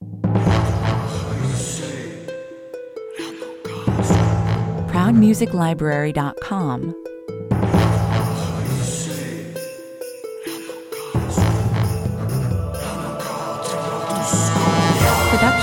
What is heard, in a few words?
mystic voices 96 bpm